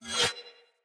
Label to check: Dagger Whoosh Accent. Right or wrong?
Right